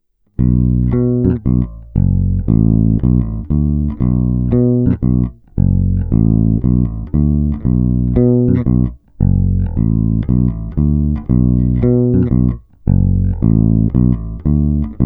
Oba snímače (FW), Mike Lull V4, baskytara

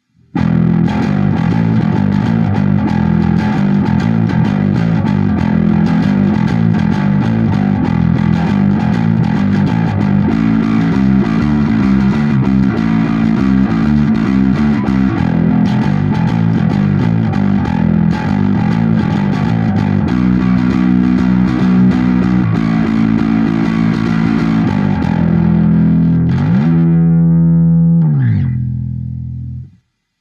Greco TB-750 Thunderbird Bass, Trsátkem na oba snímače se simulací aparátu a zkreslením

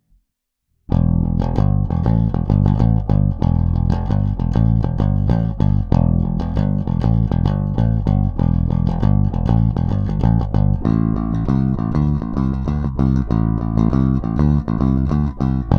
Greco TB-750 Thunderbird Bass, Trsátkem na oba snímače; Tahle basa si žádá vysloveně trsátko

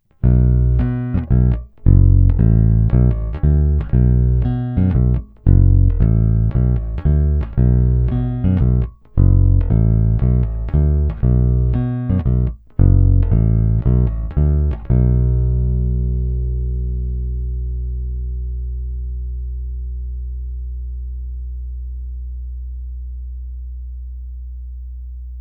Cívka 3 – snímač u krku

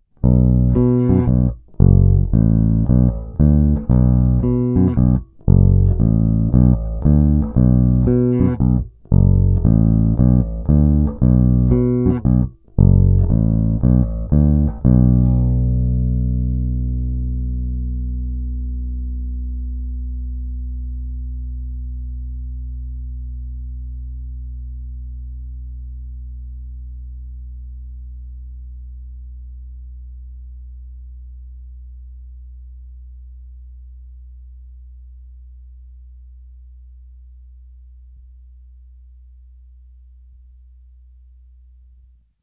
Fender Blacktop Precision Bass, Snímač u krku HB; humbuckery (HB)

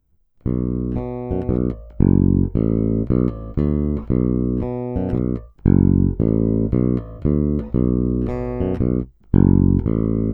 Hnědá – oba snímače sériově; Xotic XJ-1T; Hnědá – kobylkový snímač

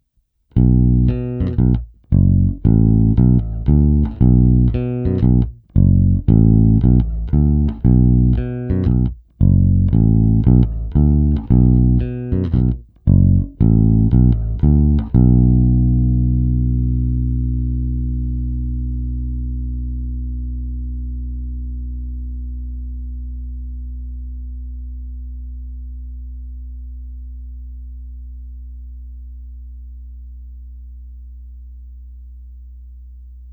Singl zapojení, Music Man Sterling baskytara; Ernie Ball Music Man Sterling

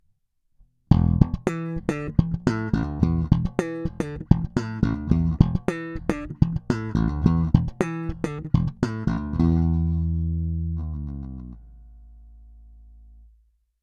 Greco TB-750 Thunderbird Bass, Slap na oba snímače

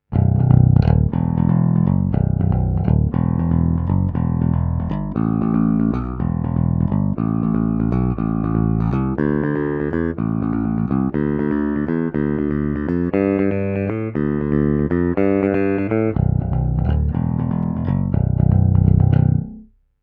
Baskytara Schecter SLS Elite, pražcová, 5 strun, trsátko, Elixir Nanoweb Nickel plated steel, roundwound; v konfiguraci snímačů H-H, charakter zvuku Classic; struny a zase jen struny

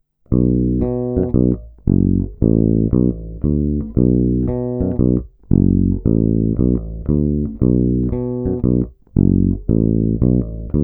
Černá – oba snímače sériově; Xotic XJ-1T; Černá – kobylkový snímač

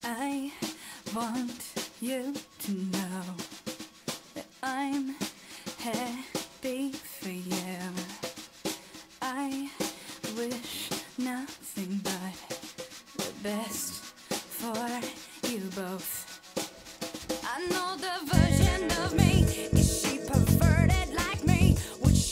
Ukazka 3 - bass mix, Music Man Sterling baskytara; Ernie Ball

